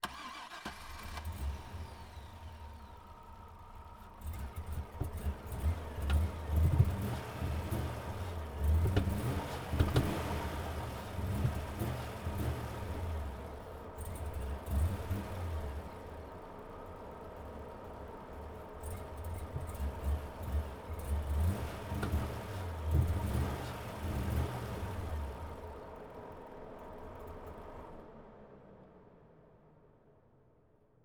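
Corvette, C5, Exterior, Idle, Various, Engine, Front; 2004; DPA4061; Pole Position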